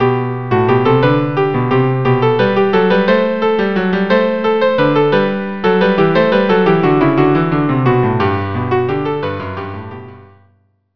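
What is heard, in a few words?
shepherd dance violin cello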